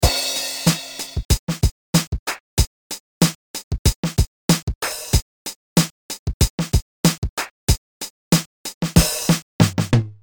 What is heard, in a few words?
beat